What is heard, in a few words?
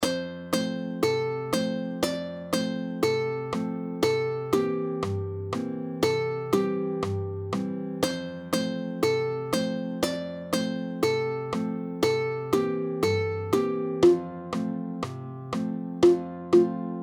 Camptown Races Ukulele Duet